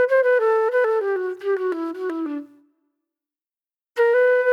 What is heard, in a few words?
Sparse Flute